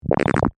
Computer Display, Scifi, Computer, Display, Screen, Open, Science, Fiction